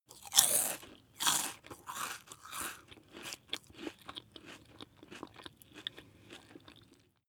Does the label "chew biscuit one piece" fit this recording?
Yes